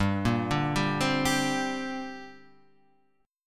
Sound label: A sharp 6th Add 9th